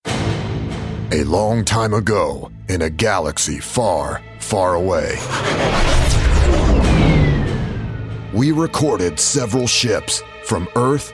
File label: Space Racer Pass Bys; Fox Audio Post Production